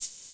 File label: GATED SHAKER; Crypton; DrumBase5000